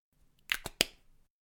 Cap Up, Unscrew cap or lid from jar or tin. Small plastic clacking sounds.